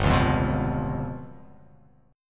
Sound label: G 7th Flat 5th